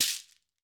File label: SHEKERE, Latin Shakers